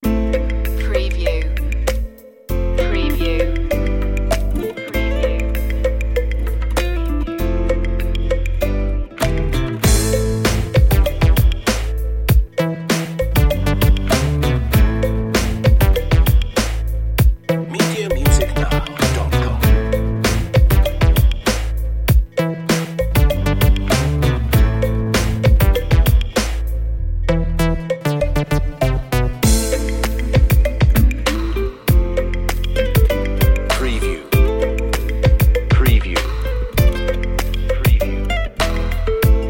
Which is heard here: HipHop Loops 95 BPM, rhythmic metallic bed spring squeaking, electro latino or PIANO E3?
electro latino